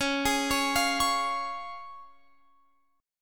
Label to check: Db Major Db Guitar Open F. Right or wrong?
Right